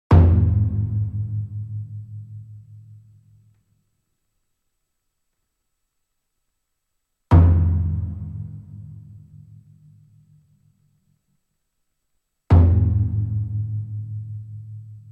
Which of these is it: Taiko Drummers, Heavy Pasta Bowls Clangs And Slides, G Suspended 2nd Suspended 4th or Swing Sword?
Taiko Drummers